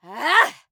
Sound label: Grunt Physical; Realm Royale; Hunter